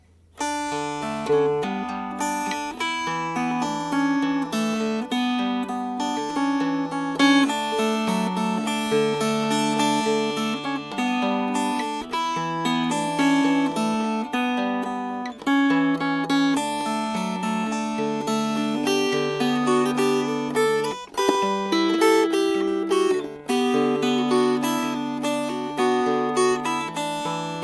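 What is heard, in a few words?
Fingerpicked, Dulcimer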